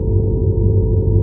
monitoring ambient; Dystopia

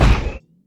heavy feet, footsteps; player; Dystopia

